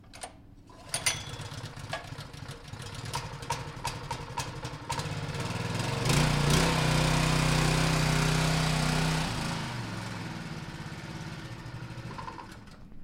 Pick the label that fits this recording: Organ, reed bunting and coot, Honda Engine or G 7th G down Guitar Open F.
Honda Engine